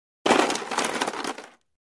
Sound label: Ice Movement In Chest; Hollywood Edge Foley Sound Library